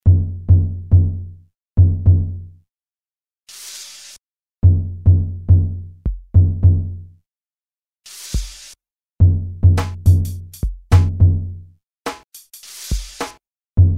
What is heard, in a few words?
DRUM